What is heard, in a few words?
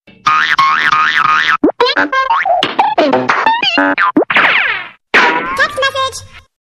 Cartoon Txt Msg